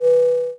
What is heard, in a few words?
start; Splashdown; XBOX; Waypoint